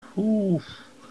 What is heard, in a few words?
fuuuuf